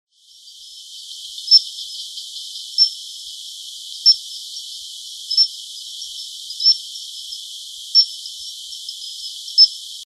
Stumpffia tetradactyla

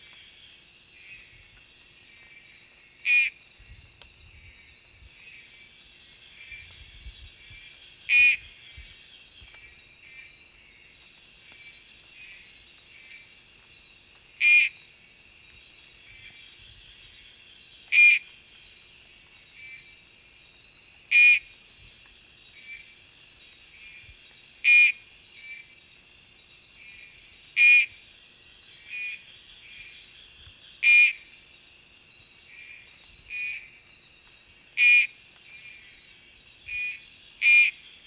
Oreophryne inornata

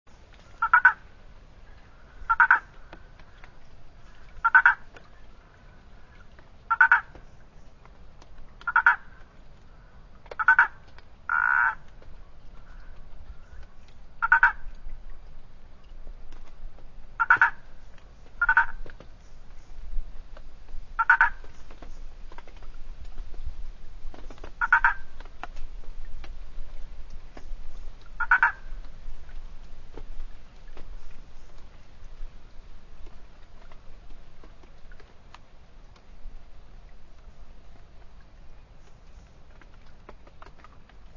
Boana prasina

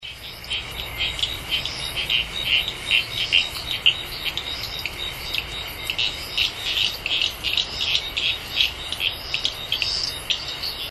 Guibemantis liber

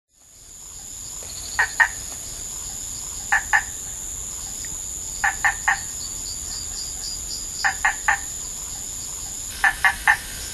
Boophis opisthodon

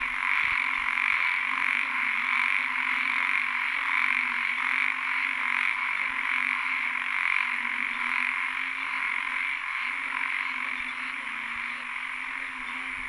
Microhyla fissipes

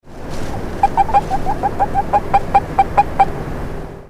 Anaxyrus boreas boreas

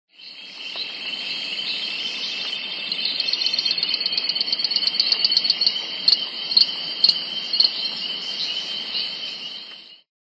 Boophis bottae

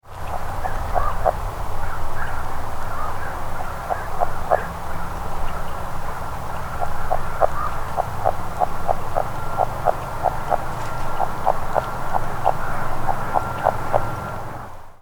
Rana aurora

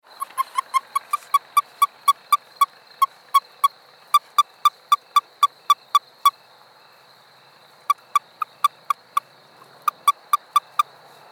Anaxyrus nelsoni

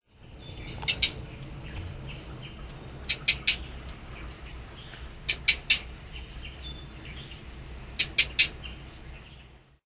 Gephyromantis webbi

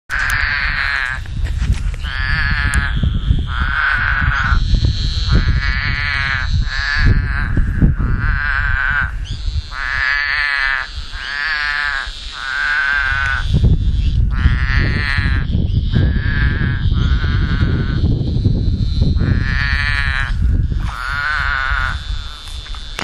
Scaphiopus couchii

